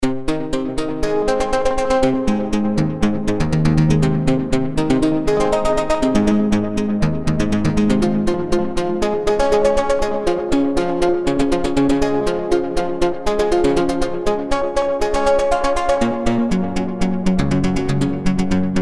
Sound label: Circle Of Fifths; Sunsyn